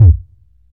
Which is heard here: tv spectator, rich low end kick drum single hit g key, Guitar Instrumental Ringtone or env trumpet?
rich low end kick drum single hit g key